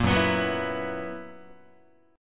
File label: F sharp 11th F sharp Guitar; F#11; 1,2,2,4,3,3; strummed